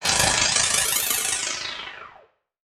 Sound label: Title Transition Electrical; Adobe; Production Element